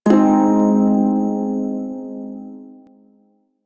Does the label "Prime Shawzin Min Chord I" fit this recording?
Yes